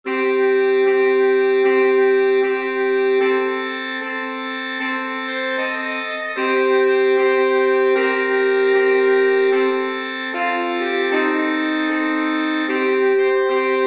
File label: Sax